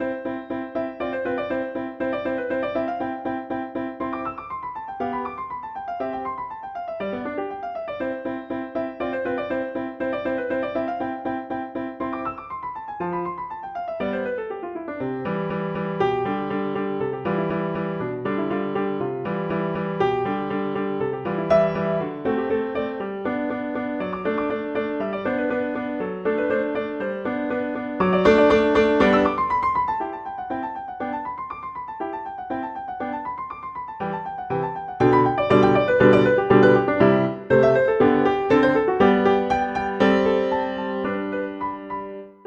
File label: Sonatina, Allegro; Clementi; Sonatinas